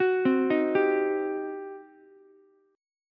G Major 7th Suspended 4th Sharp 5th, G, down, Ukulele; GM7sus4#5 arpeggiated